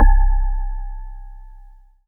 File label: C1, Synth Line; NiceBeats